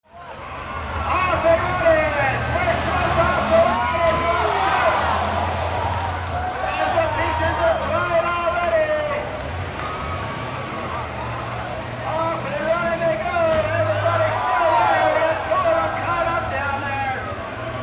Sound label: AMBIENCE